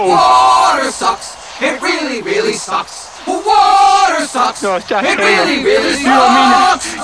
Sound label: Water